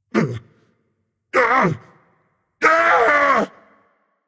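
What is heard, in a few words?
non verbal fear, voice; cernunnos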